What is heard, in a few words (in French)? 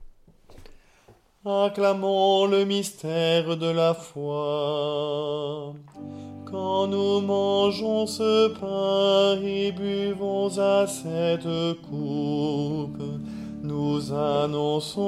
Anamnèse, liturgie catholique